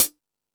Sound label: Stadium Rox, Drum Machines